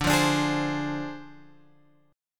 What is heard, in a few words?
D Minor 7th Flat 5th Guitar Open G